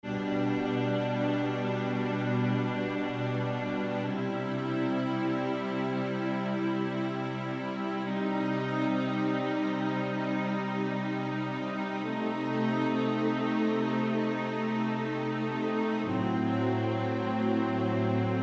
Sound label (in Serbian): akordi gudaci dur